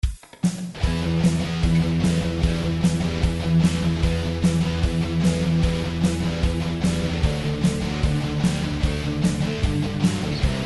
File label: Passing Tones